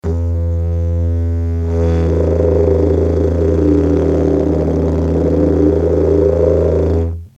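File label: didgeridoo single low hoarse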